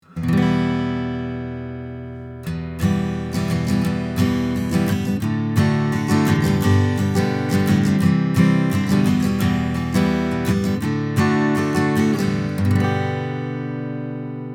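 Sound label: Acoustic Guitar Strumming, Beyer 201; RND RMP D8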